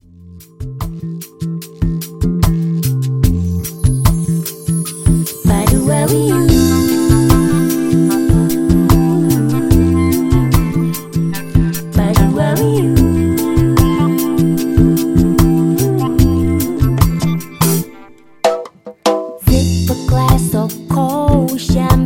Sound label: Jazz Combo Bossa